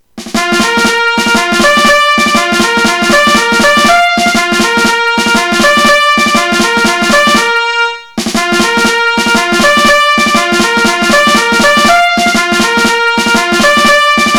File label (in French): marche clairon tambour integrale